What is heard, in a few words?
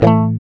GUI A, Acoustic, Roland